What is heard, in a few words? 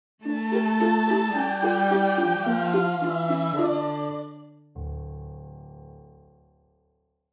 Game over you lose fantasy